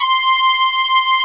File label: PROPH LD; Pro-Rec - Synthsonic; TRINITY